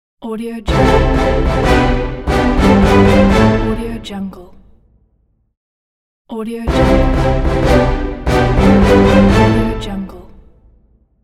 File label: mission accomplished